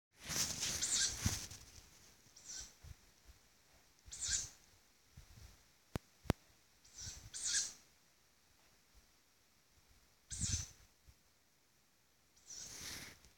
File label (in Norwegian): ukjent fugl